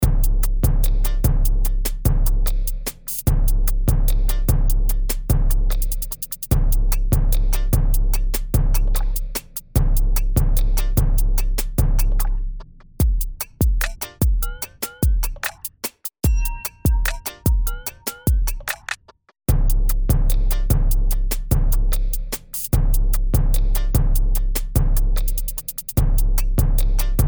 Punch Hip Hop

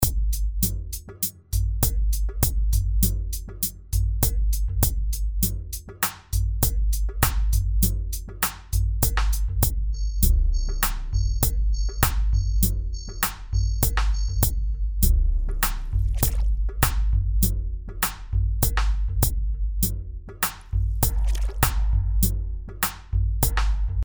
Punch Film TV

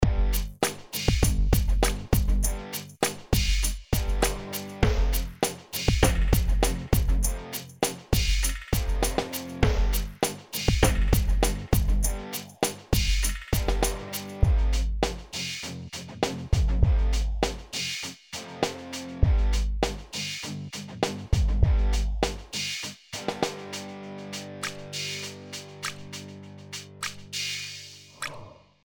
Punch Film TV; Punch Filmscore & TV example 1

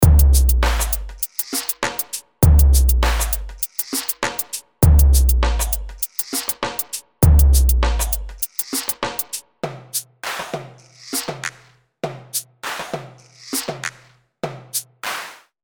Hip Hop; RP Punch

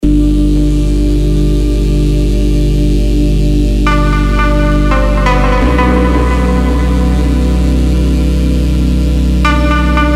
RAW Synth

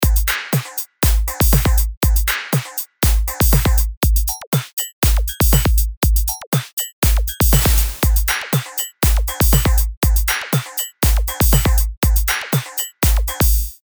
Dance; RP Punch; Punch Dance example 9

